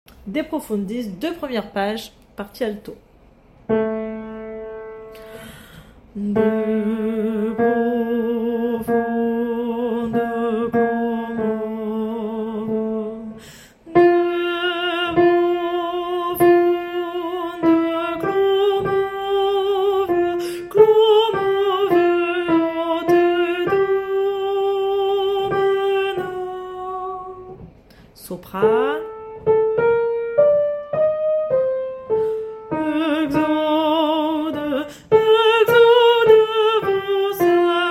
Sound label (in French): alto; De profundis page 2 et 3